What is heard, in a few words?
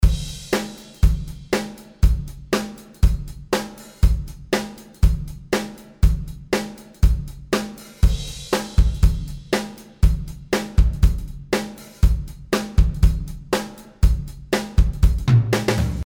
Drums